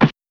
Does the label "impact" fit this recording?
Yes